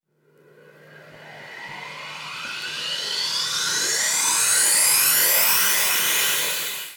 Uplifter

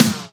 Snares; Vengeance Samples

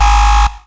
FX Alarm; Vengeance Samples

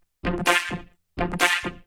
Vocoder Loops 128BPM; Vengeance Samples; VVE1 Vocal Loops

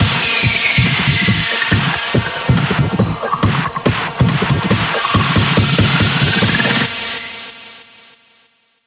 140BPM 4Bars, HandsUp & Trance Fills; UltraWaid; Vengeance Samples

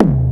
boom fx, DnB Breakz Kit; Breaks N Beats Sound Kit

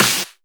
snares; Vengeance Samples

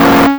Synth Sound; Vengeance Samples; VEE Synth Shots

